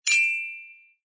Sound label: collect coin; fortune Wheel